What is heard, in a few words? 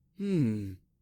hmm